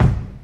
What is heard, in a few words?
Kicks Loud